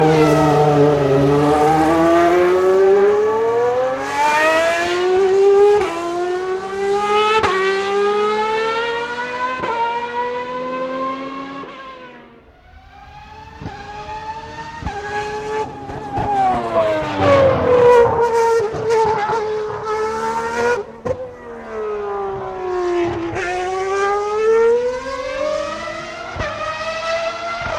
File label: Ferrari F1 V12